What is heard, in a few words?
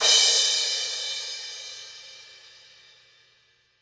crash cymbal, drums